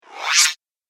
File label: Electroid Whoosh Fast